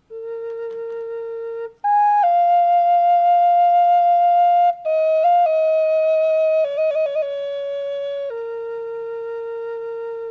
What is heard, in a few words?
cane flute